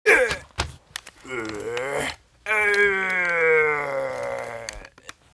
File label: Fire Lich Die; Seer; GamigoNA